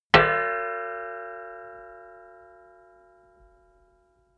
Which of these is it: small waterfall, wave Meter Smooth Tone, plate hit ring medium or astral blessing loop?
plate hit ring medium